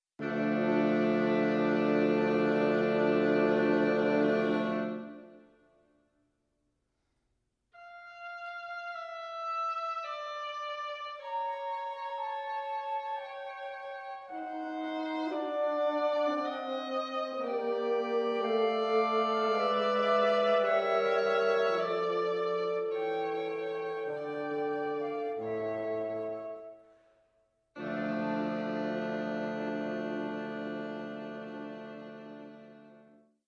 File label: Quintet